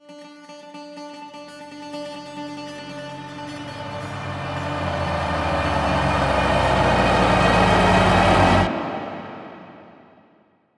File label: Void Twin Jumpscare Cue; Warframe; NineteenNinetyNine; Stage3RideOrDie